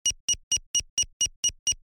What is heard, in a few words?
binary keyboard